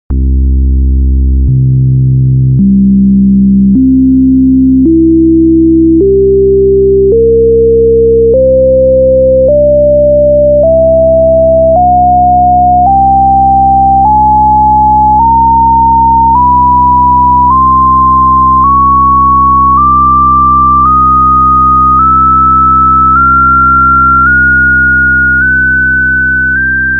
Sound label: Partials